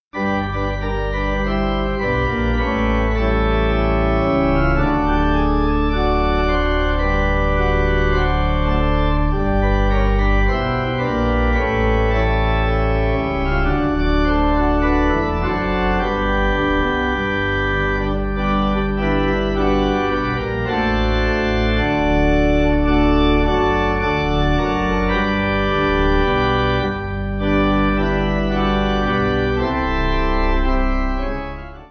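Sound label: pipe organ hymn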